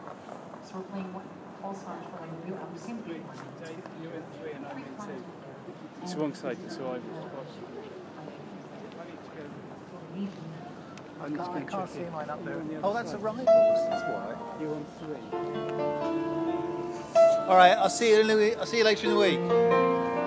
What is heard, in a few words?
live band; heathrow; schipol